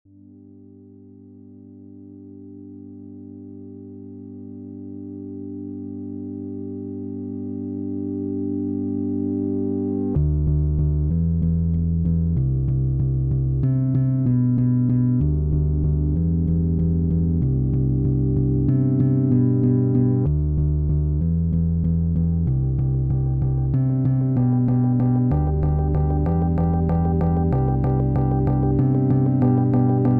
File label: 95bpm